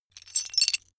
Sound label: metal pieces clatter short